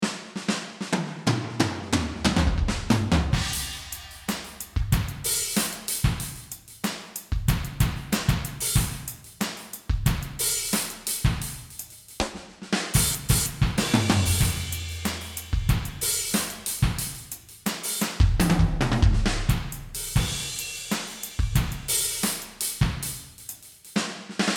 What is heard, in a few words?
Drums